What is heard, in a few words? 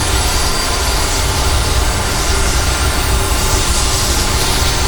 healthpack loop; odin